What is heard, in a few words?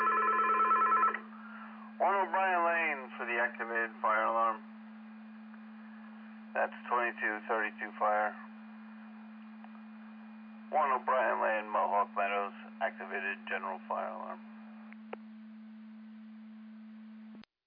Siren; Andover Twp Boro